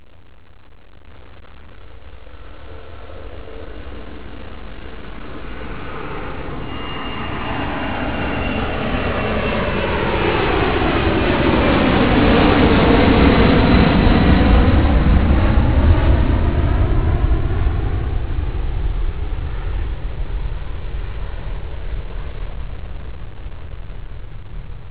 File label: air, 航空機騒音 [Aircraft-noise]